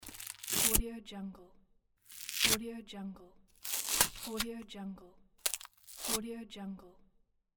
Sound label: bread break